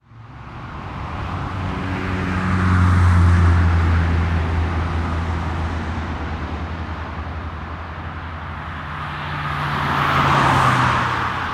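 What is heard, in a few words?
ambience urban traffic motorway medium quiet; sound effects one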